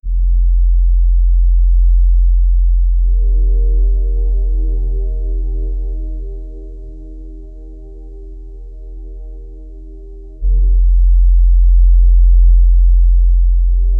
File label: relaxing soothing cosmic, cosmic